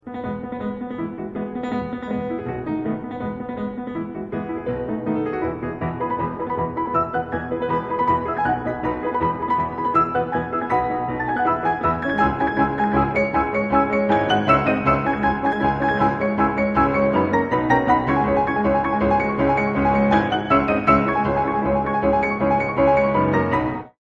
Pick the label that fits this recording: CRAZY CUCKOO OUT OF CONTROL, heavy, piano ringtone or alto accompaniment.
piano ringtone